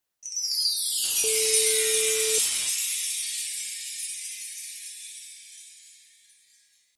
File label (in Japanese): キラキラ 効果 音